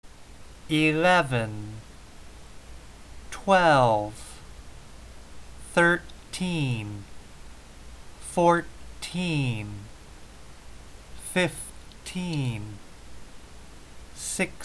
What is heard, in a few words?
slow